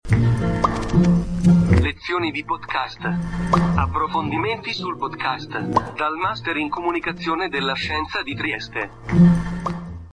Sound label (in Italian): jingle lezioni